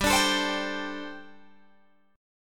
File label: G Suspended 2nd Suspended 4th G, Guitar, Open G